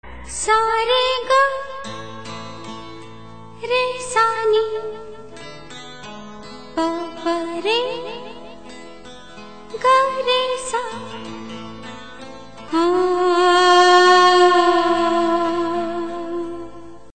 Sa Re Ga Ma Msg Tone

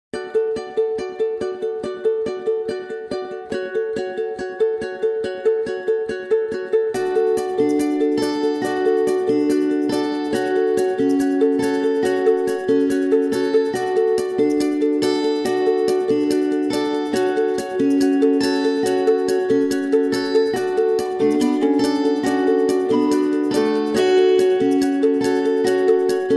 Spring Ringtone

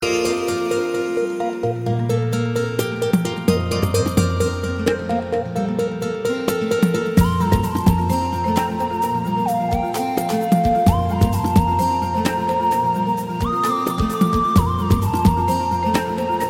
Piano Tabla Flute

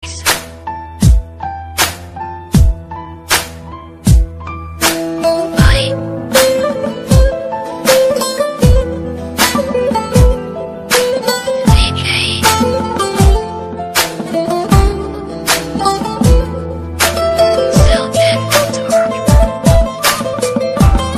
SAZ BEAT

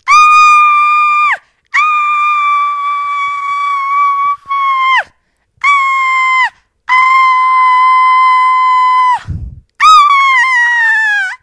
Paranormal Scream

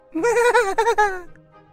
MWAHAHAH

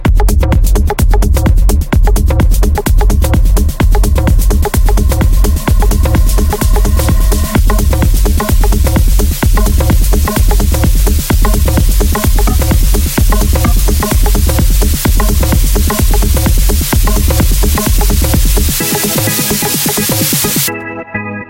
B A S S

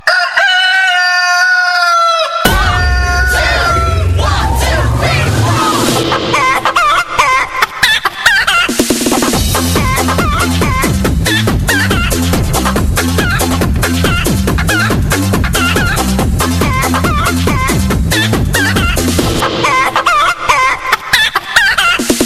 Chicken song

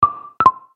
Drops

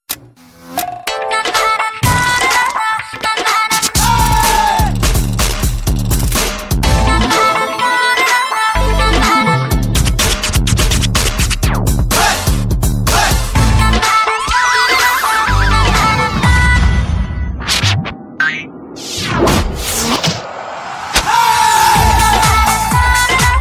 Nokia Music Tracker

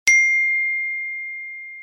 beeeep

